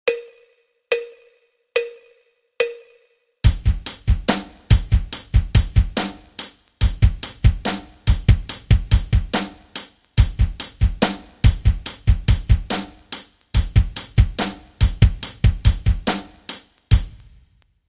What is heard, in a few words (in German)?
rock rhythmen